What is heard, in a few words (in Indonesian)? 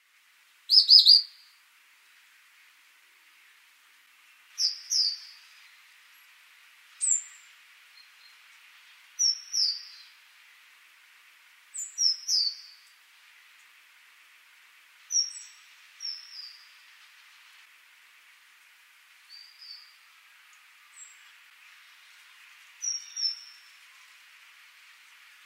panggilan jantan